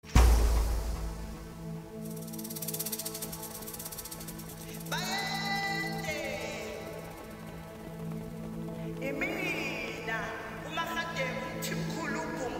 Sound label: Amapiano Mix